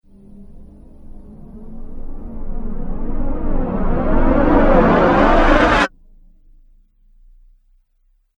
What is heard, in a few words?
Riser Abrupt Stop Flanger; Rise And Dive